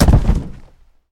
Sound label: rock Sound; ReadingRaiders